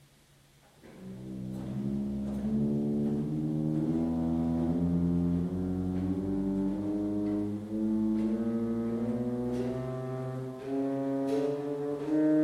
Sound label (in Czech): Pedál Cello 8', Ukázka zvuku, varhan